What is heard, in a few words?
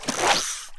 Rod Remove; unsighted